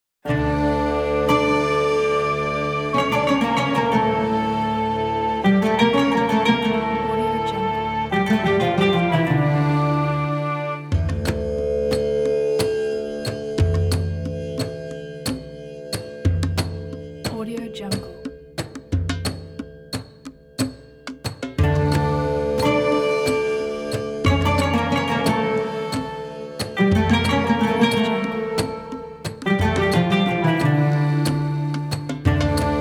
the eastern desert

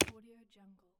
mechanical keyboard key single; stormwaveaudio

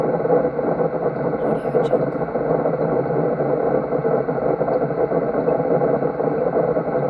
large pvc pipe water flow loop

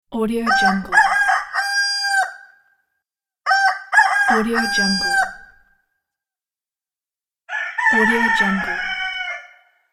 rooster

